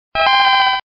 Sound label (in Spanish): Grito de Flaaffy